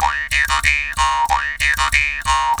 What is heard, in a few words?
D 93, JAW D 93BPM, Primitive Instruments; Didgeridoo and Other Primitive Instruments